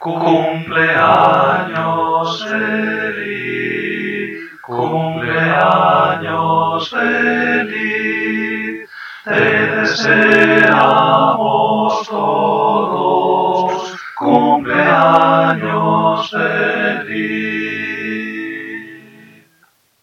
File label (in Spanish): Cumpleaños feliz cantado Todos